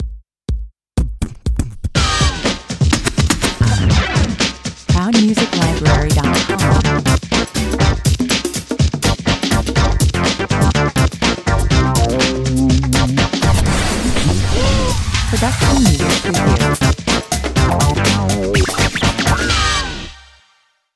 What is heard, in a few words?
breakdance dog 123 bpm